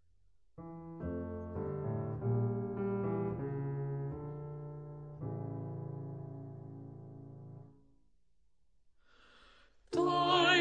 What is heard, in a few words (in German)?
Dein Will gescheh zang alt